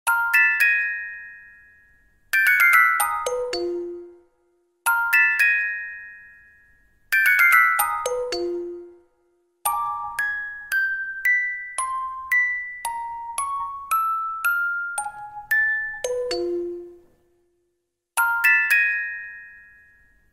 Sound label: Castle Night theme